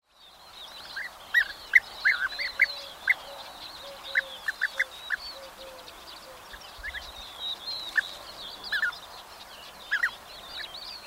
bee eater

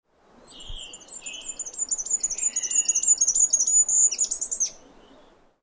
goldcrest

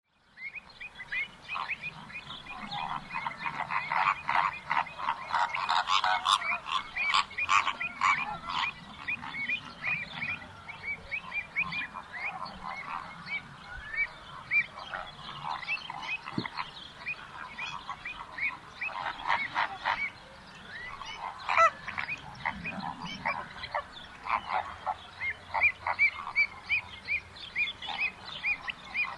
greater flamingo

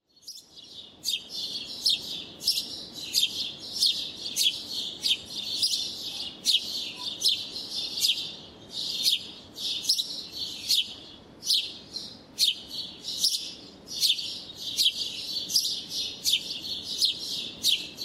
house sparrow

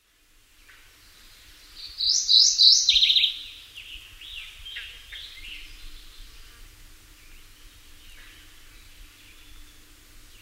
mourning warbler

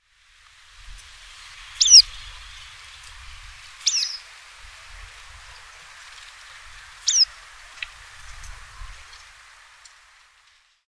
red throated pipit

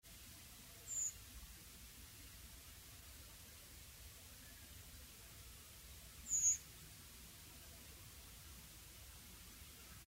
redwing nocmig

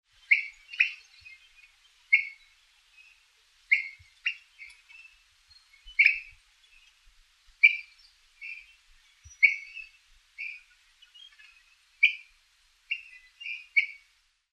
swainsons thrush

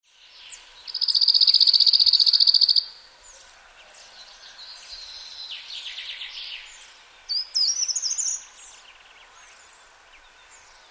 cirl bunting

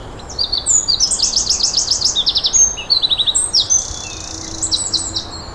wren song

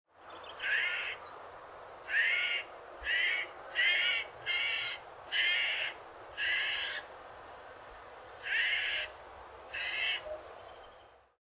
jay

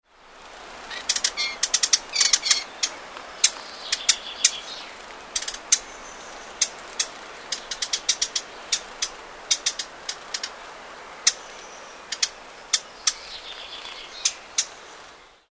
eastern subalpine warbler

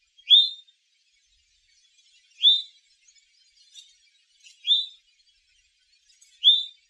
eastern towhee

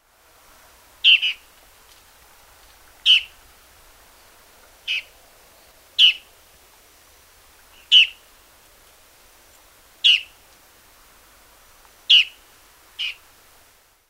long tailed triller

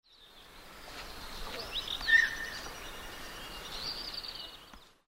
black stork

